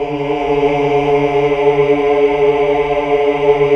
HAL AAH, GREG. AAAH Uni; Sound & Vision - Gigapack II CD 1 (Roland)

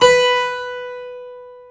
HARP B4

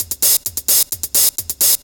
hihat 2, 130, drum loops

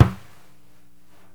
deff slap; Deff Bendir &Req

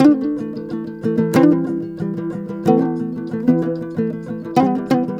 TOPSHUR; Soundscan vol.60; Asian Traditions; AKAI

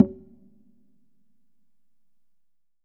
Congas wet, drums percussion

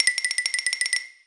Xylophone; musicradar; cartoon caper

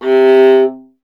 viola C; Miroslav Vitous; Solo Instruments VOL-1

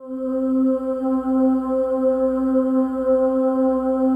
digi pad, Pads

